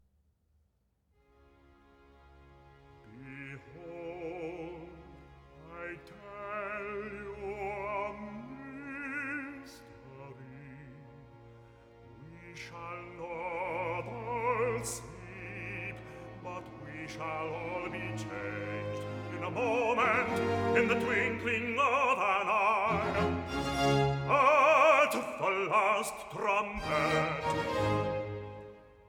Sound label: Accompagnato (Bass) "Behold, I tell you a mystery"; Messiah